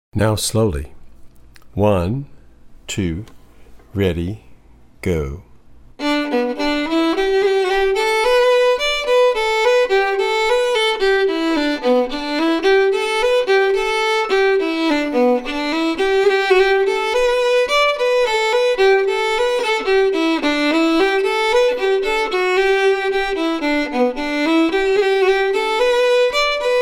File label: slow